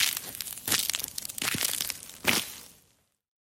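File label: footsteps on ice sounds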